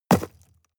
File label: shovel dig impact short